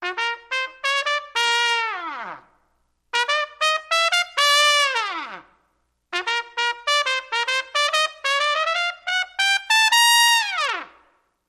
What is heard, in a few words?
Trumpet Pep Rally Shout